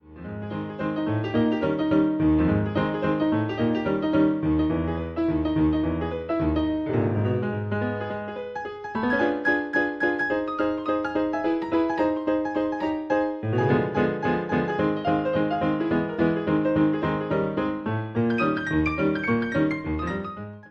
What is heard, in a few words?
maple leaf rag